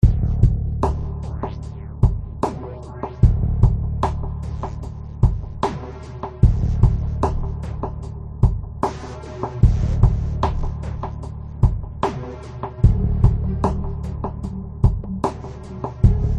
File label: menu music